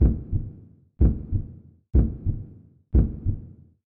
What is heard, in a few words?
heart